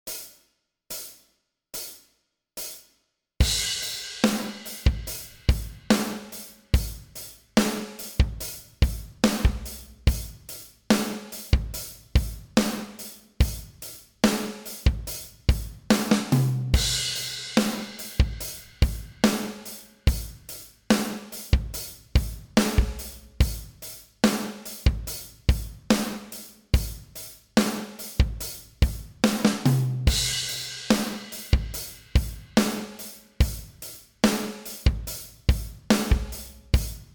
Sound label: 72bpm, bass